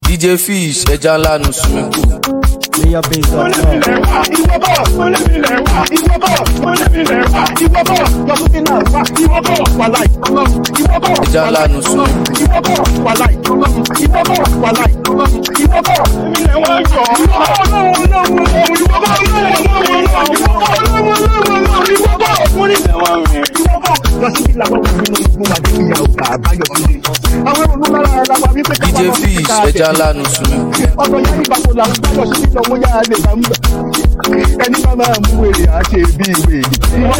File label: Beat